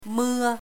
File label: rain